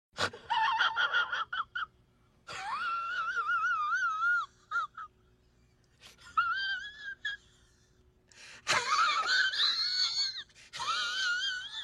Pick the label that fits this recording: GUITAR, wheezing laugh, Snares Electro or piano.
wheezing laugh